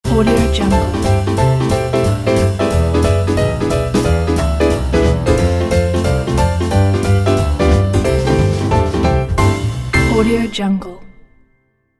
shuffle jazz logo

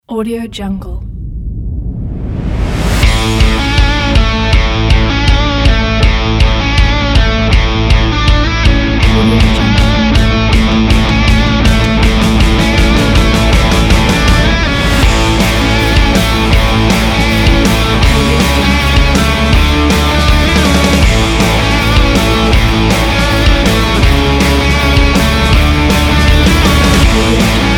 fun rock grunge

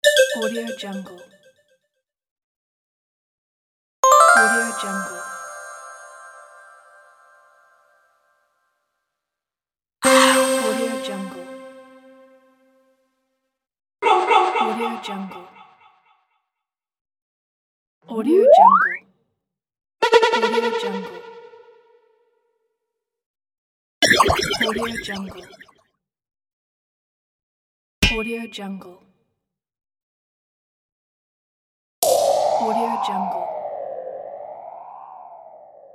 anime sound effects pack

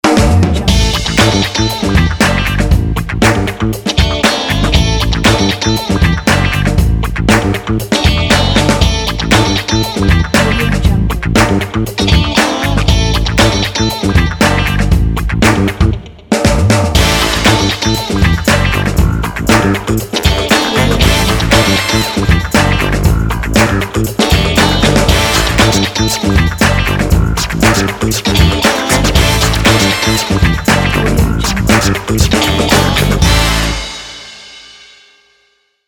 fat funky groove

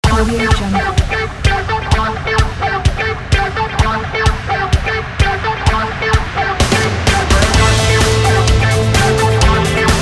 summer pop party style

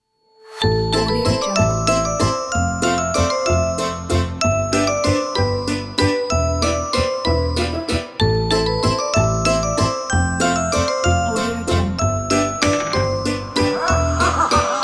halloween

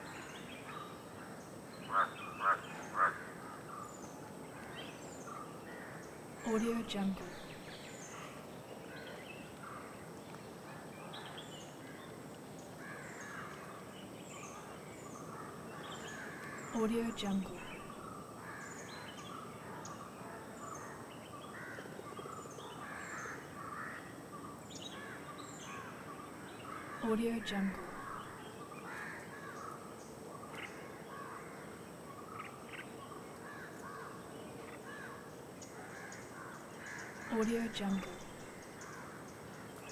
crows croaking morning loop

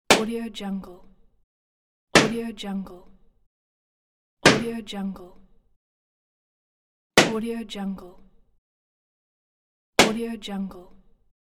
balloon burst

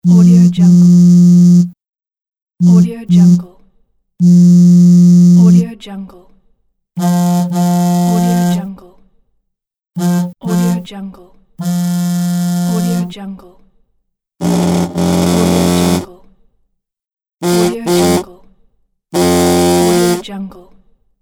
vibrating cell phone

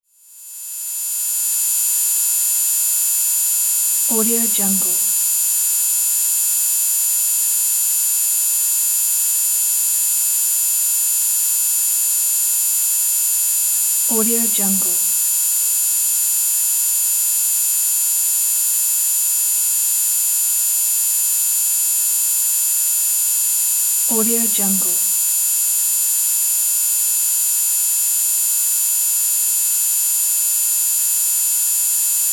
lab machine buzz; mountainaudio

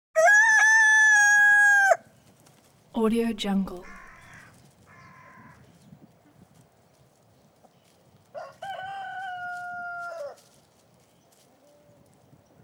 chickens

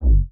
energy shield hit

